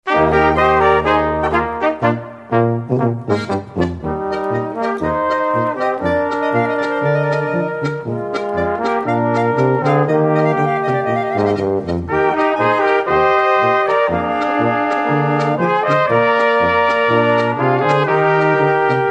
polka

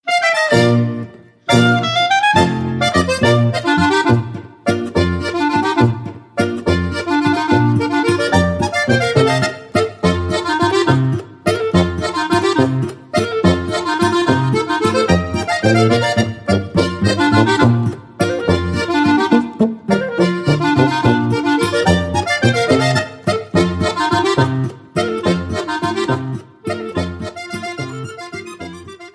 landler

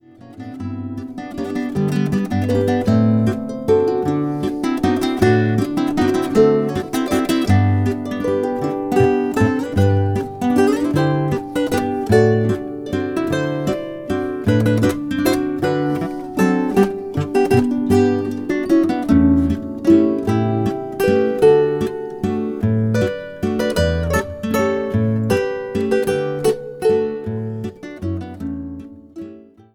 walzer

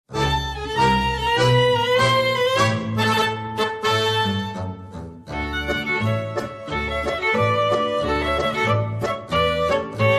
marsch

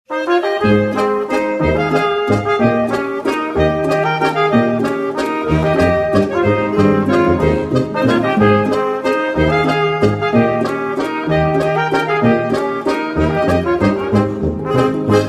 landler

